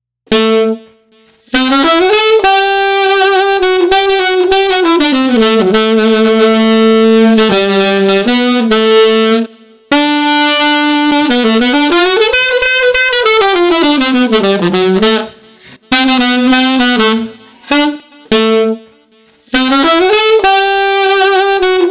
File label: Street Sax Cool Vibe 100